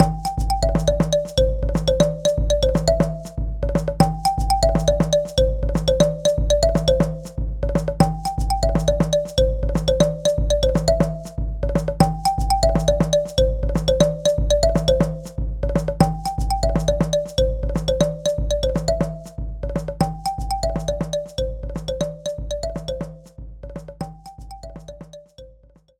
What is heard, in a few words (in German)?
Thema Jingle; my animal centre in africa 2006